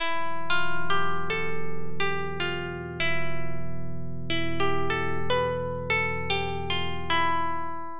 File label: evocative ancient modal, music